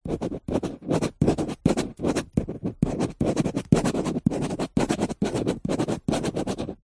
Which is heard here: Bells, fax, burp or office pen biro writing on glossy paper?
office pen biro writing on glossy paper